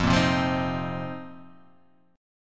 F 7th Suspended 4th F; strummed; chord; F7sus4; x,x,3,3,0,0,2,0; JGuitar

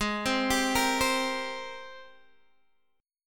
Gsharp Major 9th, Guitar, Open D

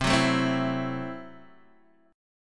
C 6th Flat 5th C down Guitar DADGAD

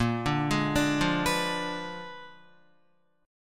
A sharp 7th Flat 9th, Guitar, A#7b9 chord; arpeggiated; JGuitar

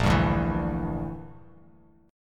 Bb 6th Flat 5th Bb, Bass Standard; Bb6b5 strummed

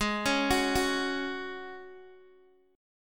Ab 7th Suspended 4th Ab Guitar DADGAD

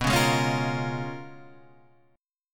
Db 13th B, guitar

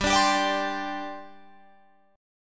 G Suspended 4th G down Guitar Open F; Gsus4 strummed

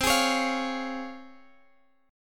Db Major C; Db/C; strummed

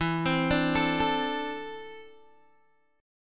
E 7th Suspended 4th Sharp 5th, Guitar, Open G

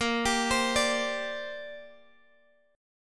A sharp 6th Add 9th, Guitar, DADGAD; down

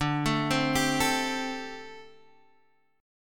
D 7th Suspended 4th, down, Guitar, Half Step Down

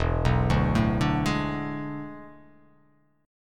E 11th; arpeggiated; JGuitar

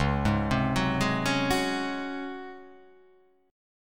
C sharp Major 7th Suspended 4th C sharp

